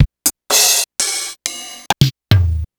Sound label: Yamaha PSR-6300